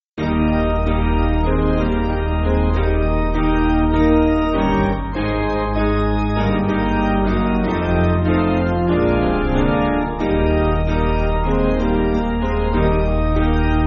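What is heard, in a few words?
Piano, Backing Files for Churches; Worrell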